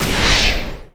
Enemy Sandwall Hand Delete

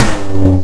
Tom (G3); Guitar Rock Tour

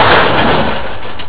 Car

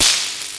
Electro Hit; Fitness

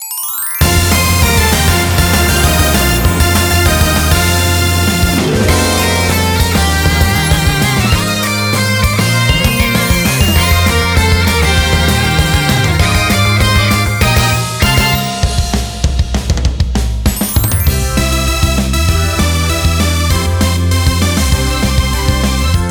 BGM Reach

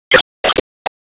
Zantetsuball Hit; Disney Star Smash; Android, iOS, Mobile

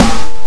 Snare (E3); guitar rock tour

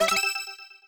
Coin

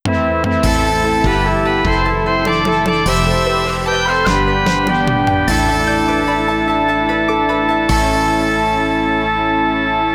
Final Stage Complete [Roland LA]

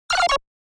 Fill Tech Square 160 D#

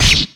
Gimmick Spike Hit

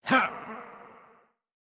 Effort Small

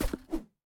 Menu Close

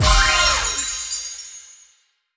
Pick Up Key; Subway Surfers City; Android, iOS

